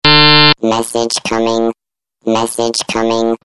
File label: Message Coming, SMS Tone